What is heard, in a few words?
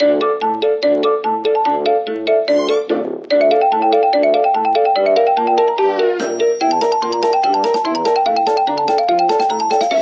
Bolt Toy; Samsung; Samsung Movie Maker 1.2.06